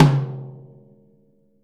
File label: Living Drums; Sampleheads; Peter Erskine